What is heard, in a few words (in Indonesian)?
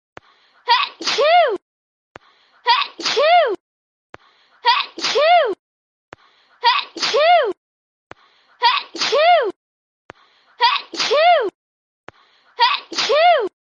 Suara bersin lucu